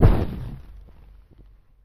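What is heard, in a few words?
Impact Heavy Something, fireballs; Impact Heavy Into Something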